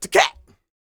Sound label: YELL, MALE HUMAN; Spectrasonics Vocal Planet